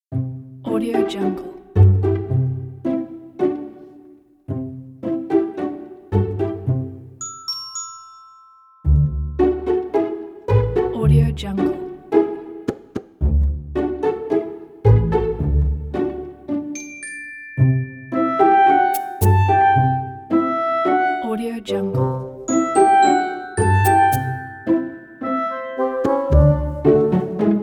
cartoon comedy

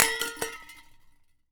steampunk weapons grenade canister bounce; soundmorph

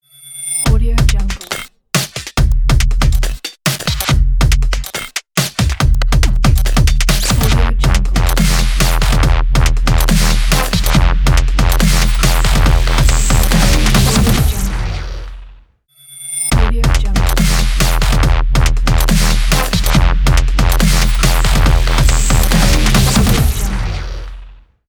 digital glitch dubstep logo

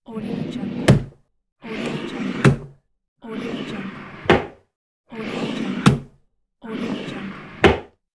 drawer

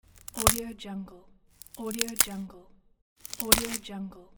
branch break

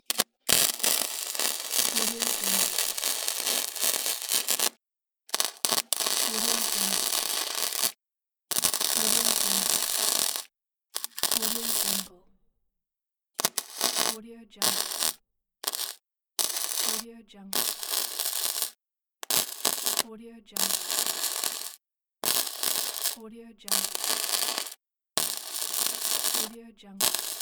welding

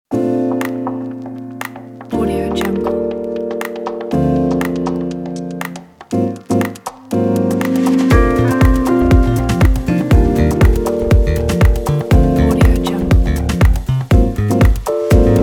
cafe music

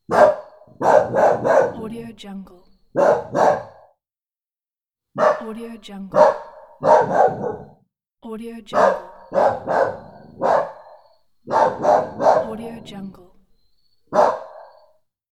dog barking

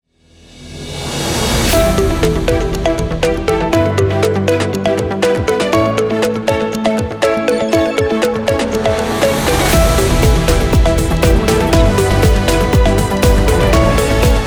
breaking news ident

